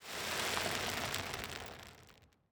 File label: impacts; World of Tanks